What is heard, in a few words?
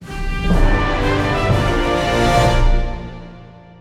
Hero Theme Stinger 120BPM; Fortnite